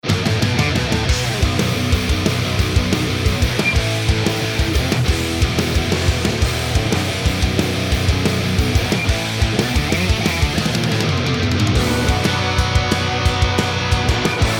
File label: MIX 5153 QC